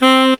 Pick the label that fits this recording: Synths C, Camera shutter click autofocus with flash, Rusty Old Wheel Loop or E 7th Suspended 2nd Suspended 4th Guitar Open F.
Synths C